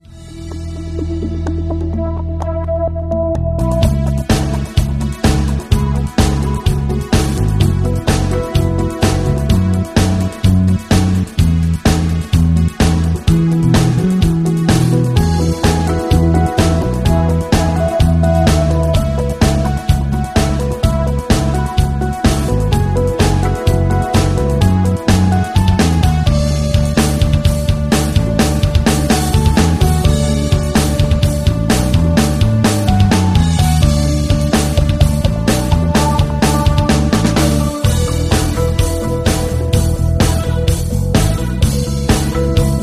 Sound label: rhythm